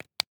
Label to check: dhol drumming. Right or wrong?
Wrong